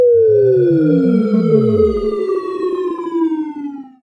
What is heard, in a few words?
attraction bomb, explosions